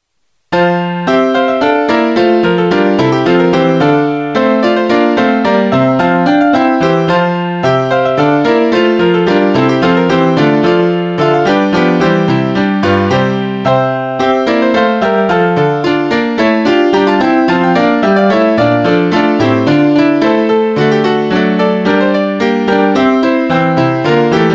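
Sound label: hornpipe piano mix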